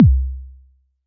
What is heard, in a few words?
gliss down; Kicks; single hits